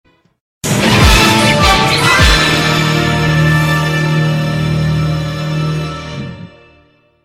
Victory Fanfare; Ff13

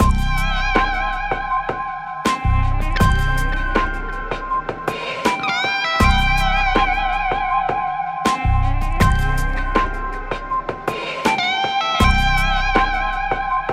ambient guitar

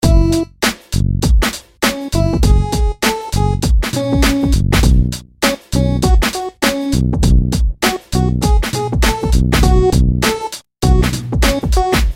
Hip Hop Beat

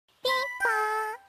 Beep Boo Suu

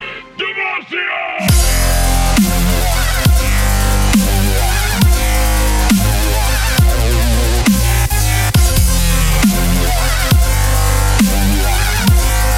Dubstep; Lol Demacia

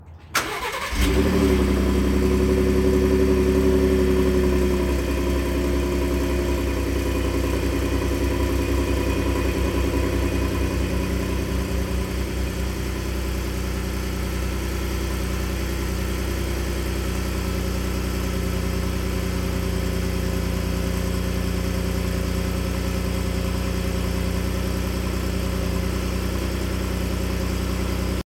EcoBoost V6 Start-Up

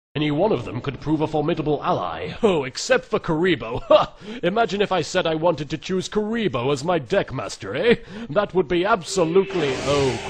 Annoying; Kuriboh

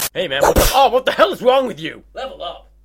Level Up

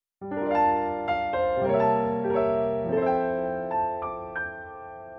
Game Over Piano; SMW

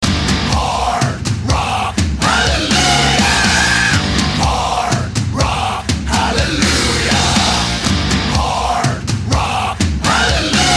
Hard Rock

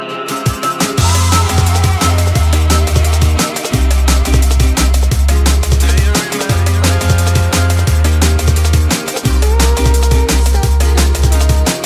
Dnb

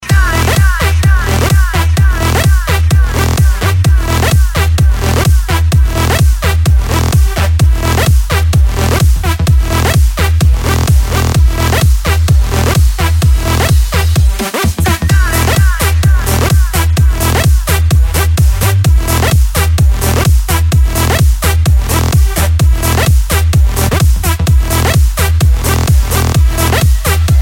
Party Shaker

